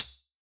ride oneshot, Cymbals; GhostHack

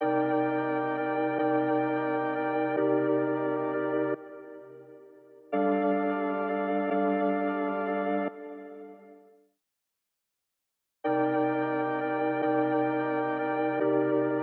174 BPM G Min Keys; RnB; Loop Stems; Cymatics; 2022 Melody Collection; RnB Pt 2; No Way Home

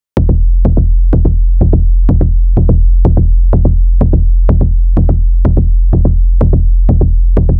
Bassline 125 F Footwork

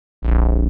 125 BPM A# Min Bass Fill; Melody Loops; Loop Stems; Cymatics; Antidote

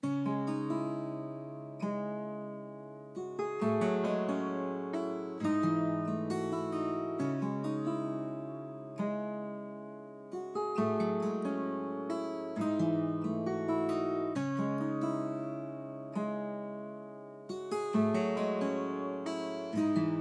134 BPM C# Min Guitar; World; Loop Stems; Cymatics; Senses

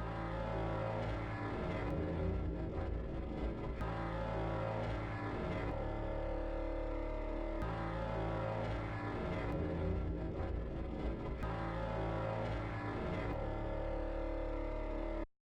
126 BPM D Min Texture; Loop Stems; Cymatics; 2022 Melody Collection Platinum Expansion; Fusion

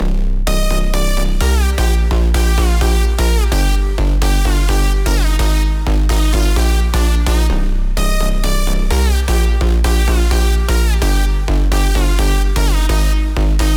128 BPM C Min, House Drop Loops; Pastel Rain; Cymatics

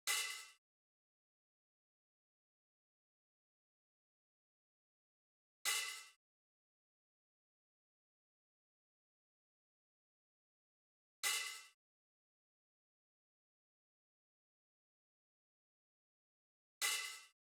Drum Loop - 86 BPM Open Hat; Loop Stems; Cymatics; DOPE DRUMS VOL. 1; Sky

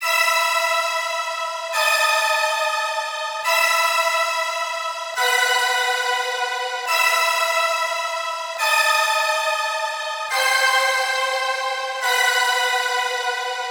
Riddim Melodic Loop E 140 Cicadas; Ghosthack; Riddim Essentials Volume 2

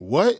Dry Chant "What"; Cymatics; Euphoria Vocal Sample Pack; Chants

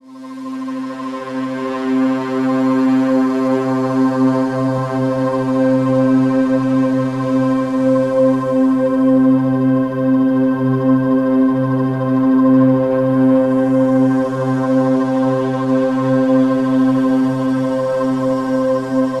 Atmosphere

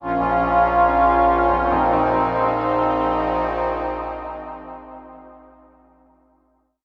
PHRASE - 140 BPM A# Min, Ear Candy; Zelda; Cymatics; ANALOG EVOLUTION Beta

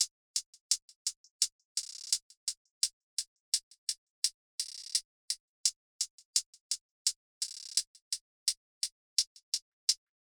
Drum Loop 85 BPM Hihat; Loop Stems; Cymatics; Vibes II Drum Loop Collection; Quilt

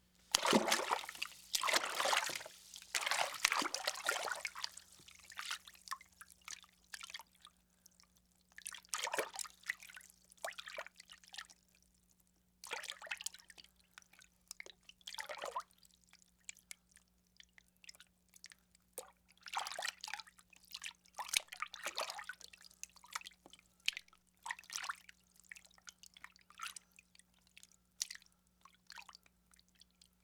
Bathing Water Splashes; Fairlight Sound Lib

